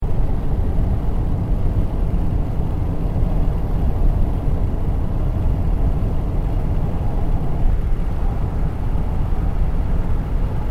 Car Toyota Kluger interior driving window open loop; Toyata hire car; Cars; Vehicles